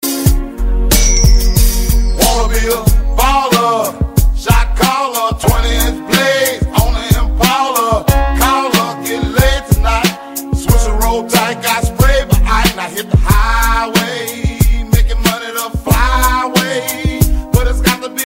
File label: english rap song ringtone